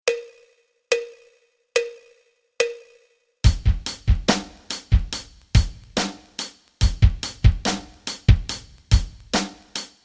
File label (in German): rock rhythmen